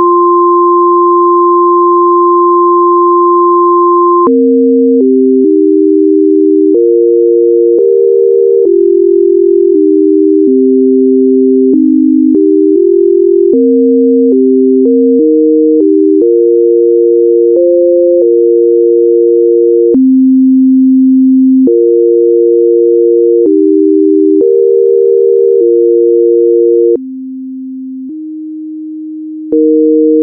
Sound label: midi record sample